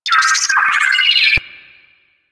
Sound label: Ship Advert Bot Chatter; Ambience; Props; Warframe; Corpus Ship Remaster